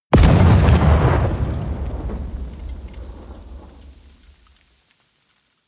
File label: explosion large dirt fall